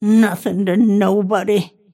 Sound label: last hit pain; snapfire